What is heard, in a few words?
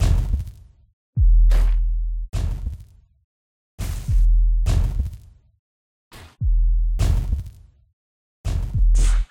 Storm Drums; gamerip 2016; The Solus Project